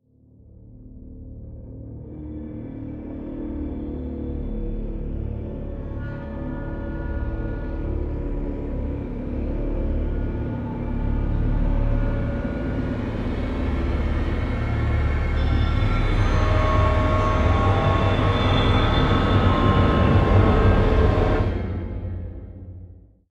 Helicopter Crash Site; gamerip; Call of Duty Modern Warfare 2 Remastered

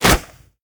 Fishmen Garpun Attack; we are the dwarves gamerip 2016

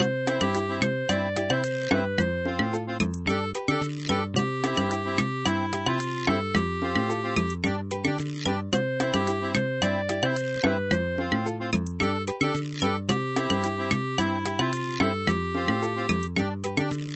Title Music; papas cupcakeria online windows gamerip 2013

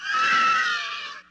Cave Creature; children of morta

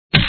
Punch

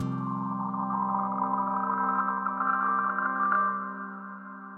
Link Drag; murder mystery machine; ps4; xbox series xs

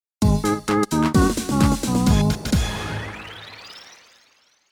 JINGLE2 (Game Over Moment); Idol Janshi Suchie-Pai Collection

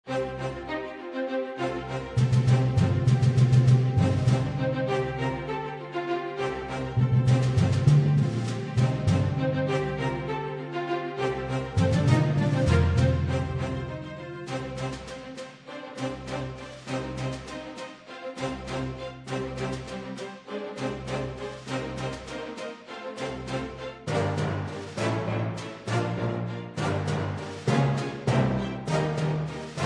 Combat Music; Boom Beach

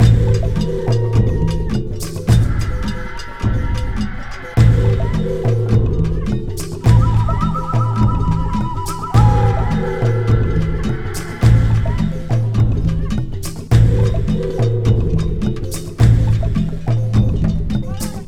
Worshipper Chant Aztec; Black & White 2

